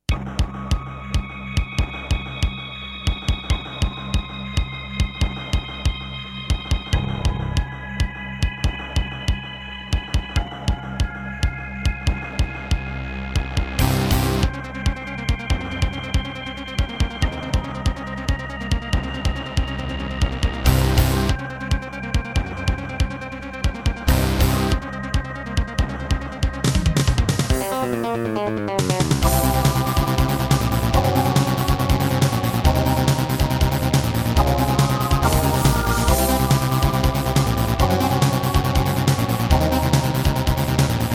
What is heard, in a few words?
Hizon DB333; sigil doom episode 5